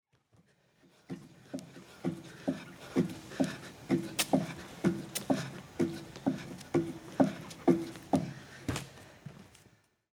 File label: pommel horse